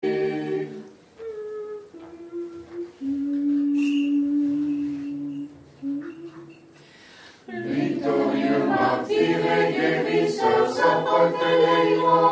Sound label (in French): Tropaire saint Panteleimon Matine de la vigile orthodoxe